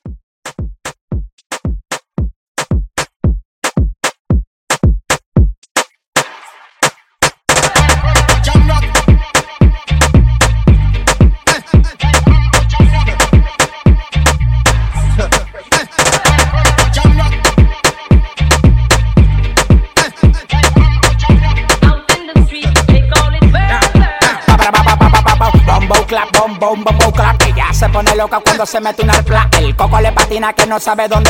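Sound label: Moombahton; Moomba Edit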